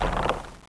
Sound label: bow draw; warcraft3